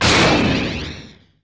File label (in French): impact bouclier